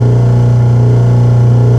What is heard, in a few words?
DISPOSAL, Kitchen Counter; SFX